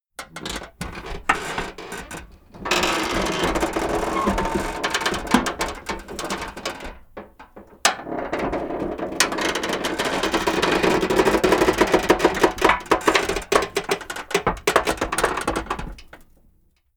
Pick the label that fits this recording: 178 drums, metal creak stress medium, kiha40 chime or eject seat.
metal creak stress medium